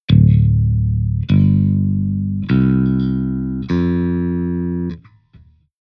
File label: Bass Tuning